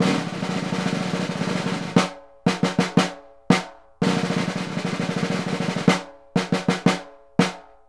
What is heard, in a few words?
SNR, Synclavier Percussion 2 - World & Orchestral